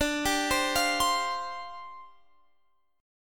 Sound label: G 7th Suspended 4th D